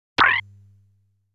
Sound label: Enemy Fires a Bullet